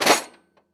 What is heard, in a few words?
Tool Case Open